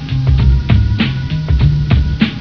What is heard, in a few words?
rap